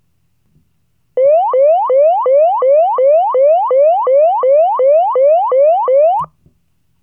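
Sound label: yelp, siren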